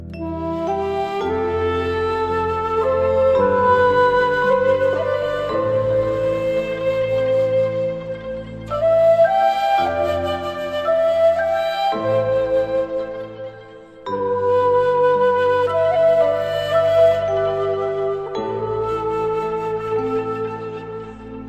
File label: relaxing zen